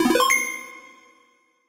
GUI save game; Pokemon Solar Light & Lunar Dark 1.0.1

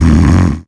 snore; Pokemon Solar Light & Lunar Dark 1.0.1

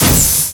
dragon; Pokemon Solar Light & Lunar Dark 1.0.1

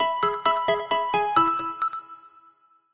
Pkmn healing; Pokemon Solar Light & Lunar Dark 1.0.1

